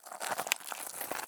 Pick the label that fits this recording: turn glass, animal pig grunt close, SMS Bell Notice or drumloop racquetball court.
turn glass